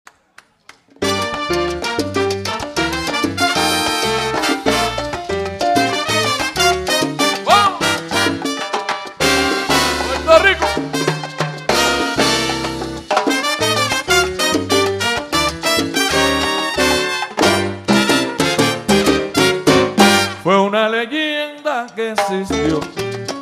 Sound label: Perc Salsa; salsa latin